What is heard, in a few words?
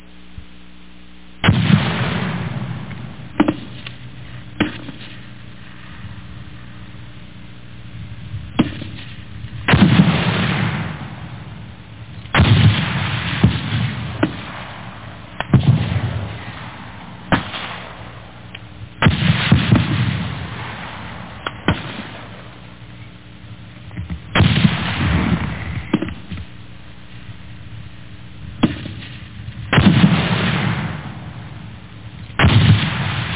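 grenade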